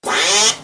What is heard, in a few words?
Quack; Cyclone